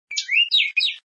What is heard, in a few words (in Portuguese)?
canto bicudo